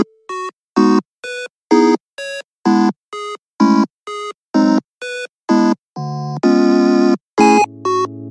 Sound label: intro music; xinalized; legman